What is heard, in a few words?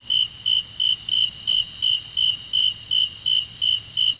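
Crickets Chirp Night Ambience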